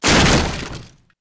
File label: BR CRSH